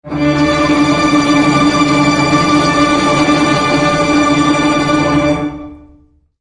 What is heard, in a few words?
tremolo strings; Orchestral